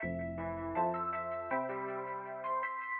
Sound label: Piano 80 Bpm